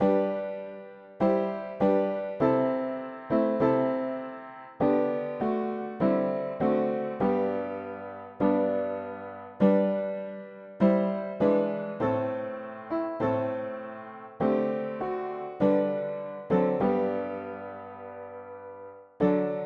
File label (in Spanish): mono piano; hymns; Himnario pequeno